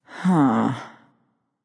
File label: nv sigh; smite; awilix